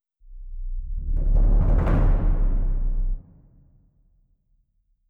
bass drum; Netease

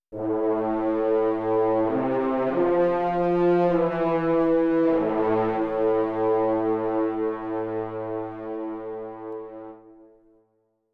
horns; Netease

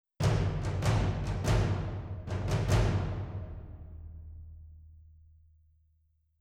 surdos; Netease

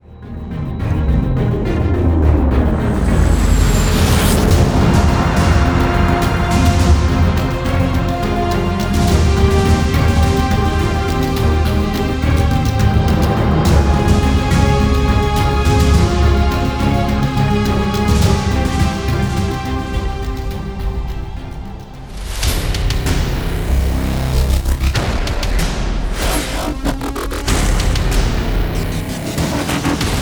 Hybrid Colliders Intensifiers Montage

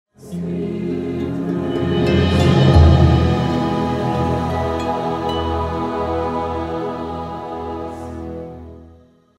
respawn; netease

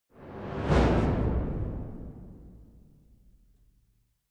orchestra swell; Netease; gank

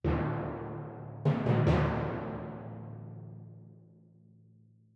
timpani hits; Netease; kill boss phoenix

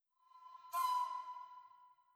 wind fx; Netease

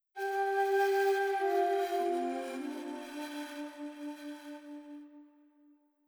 shakuhachi; Netease

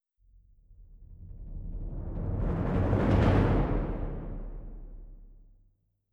bass drum; Netease; gank

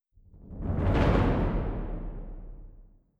bass drum; Netease; gank

